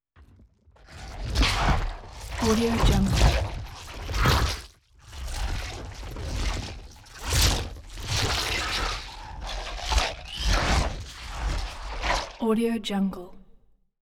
tentacle movement

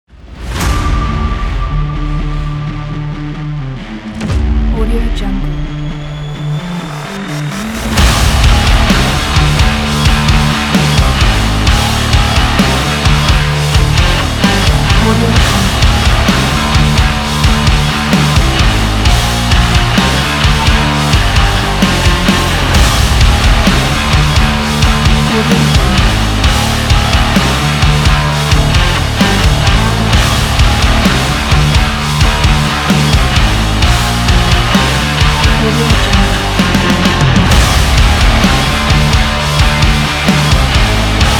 aggressive angry rock trailer teaser; cinematic trailers

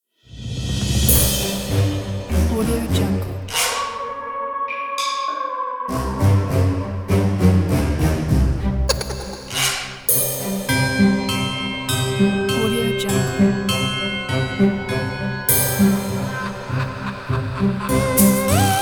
halloween

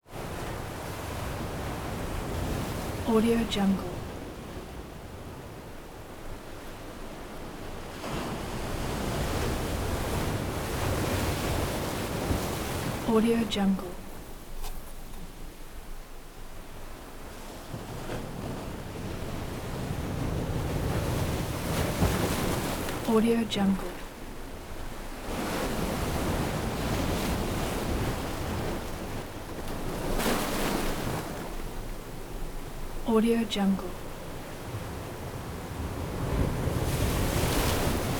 wave crash constant impacts on rock

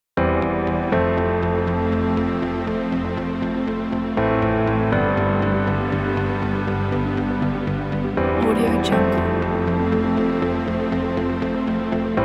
promo intro

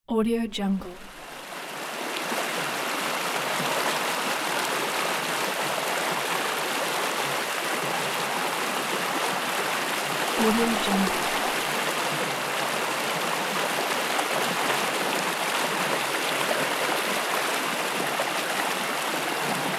water stream

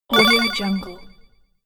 collect swoosh bonus

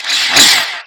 stalker communicate; monster within; soundmorph

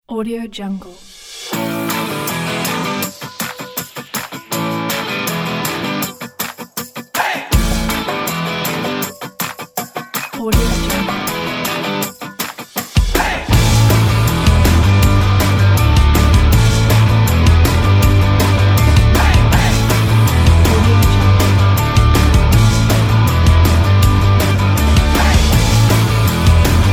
positive driving rock pack

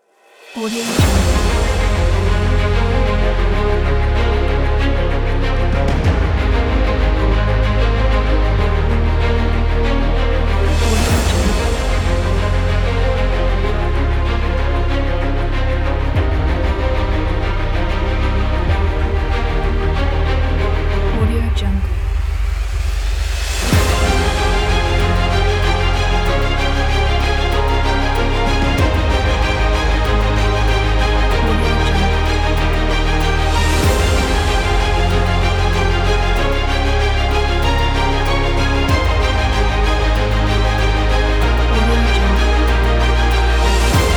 epic cinematic

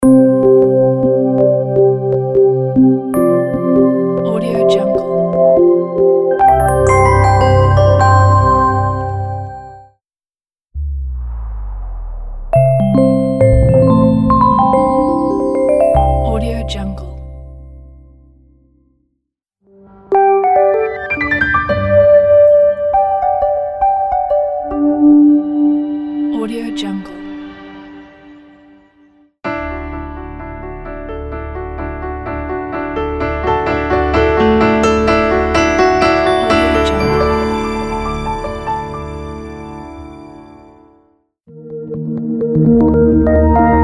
ultimate corporate logo pack

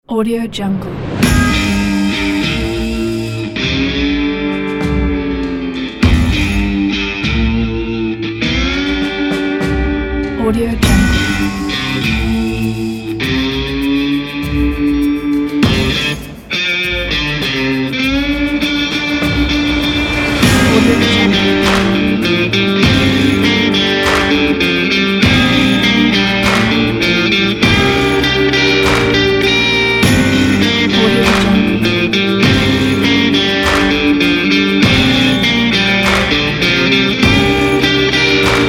western

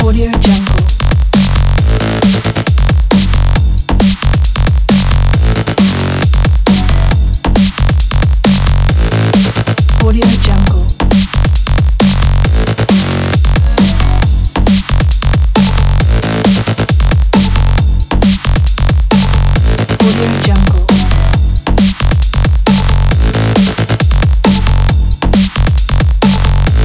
breaks loop

